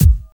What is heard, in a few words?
clean bass drum one shot a sharp key, Royality free kick drum sound tuned to the A# note.; kick drum samples